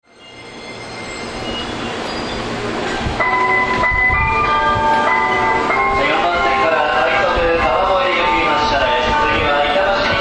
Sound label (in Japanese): 池袋駅, メロディ; 山手線; 新宿・渋谷方面